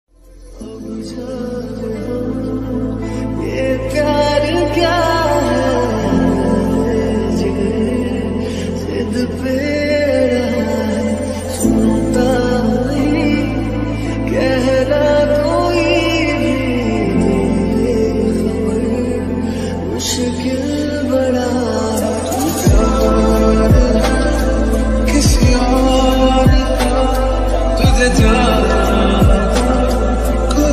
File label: romantic love ringtone